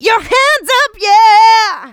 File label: voc, female vox, Vocal House